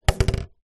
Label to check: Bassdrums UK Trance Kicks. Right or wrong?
Wrong